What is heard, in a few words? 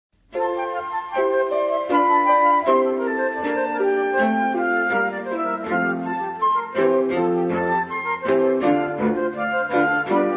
sinfonia allegro